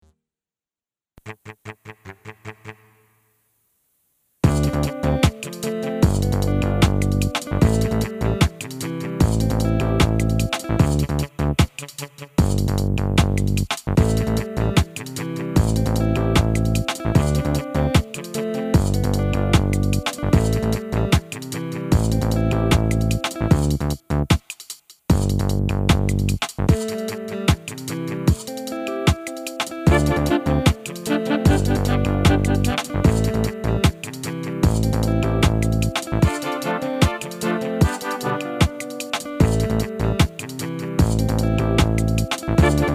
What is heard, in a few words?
Ragga Jump Beat